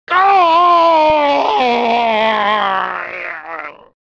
crashed scream; nightwolf

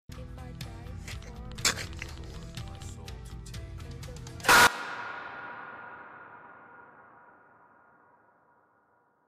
disappears

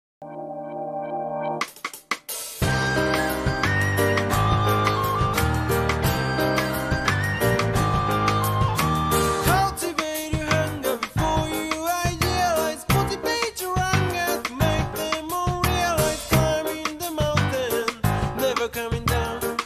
wind; naruto

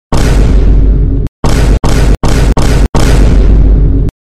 rock meme sound

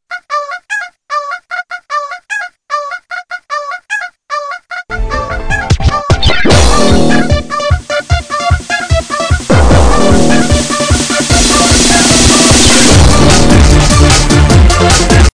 icq remix

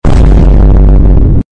rock sound effect

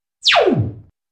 radio tune out

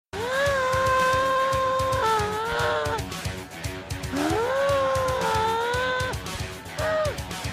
aaaaah

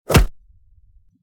thump

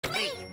buzzer

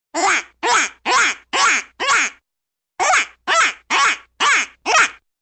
squeaky duck call

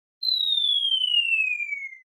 shot dropping

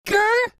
basketball grrrr

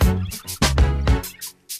question music only

